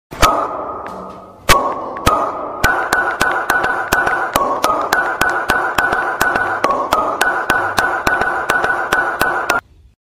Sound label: Puppet Fidget